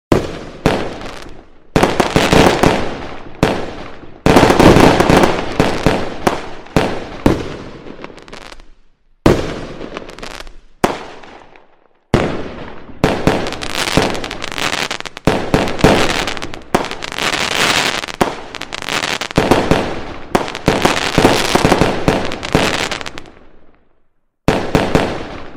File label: Fireworks